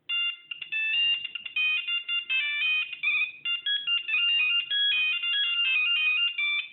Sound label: nokia 1100 ringtone; rote